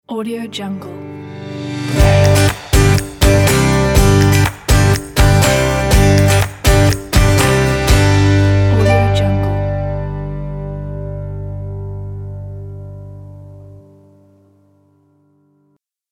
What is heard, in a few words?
happy guitar logo